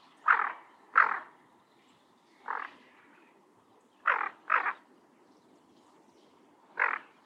kinkurohajiro, bird

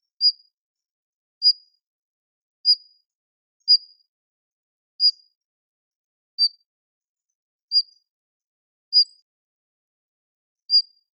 joubitaki

